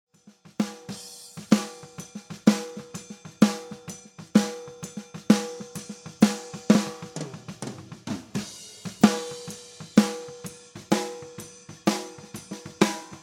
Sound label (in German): Snare Phase, die Snare erst mit gedrehter Phase des Mikros unten (fetter Sound) - dann mit beiden Mikros gleichphasig (dünner Sound) - dann nochmal hin und her; Snaremikros - Phasenlage zueinander